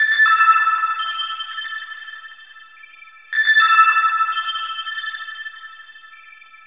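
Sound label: chiming, bell atmos; Zero G; Malice In Wonderland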